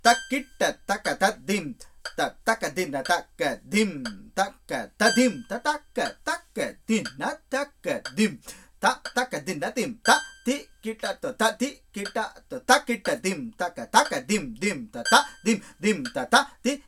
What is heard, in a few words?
changing nadai ch to misra